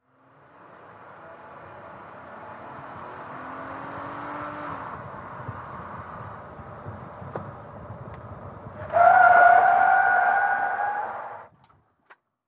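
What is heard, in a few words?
SUV Truck Accel Skid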